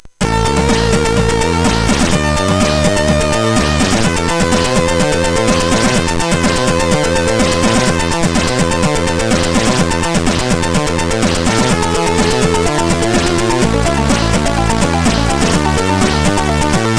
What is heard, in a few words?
Trance, CSG8580